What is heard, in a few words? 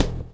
blow; Spectrasonics; Metamorphosis